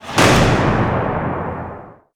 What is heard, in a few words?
SE GAME SHUTDOWN; KALEID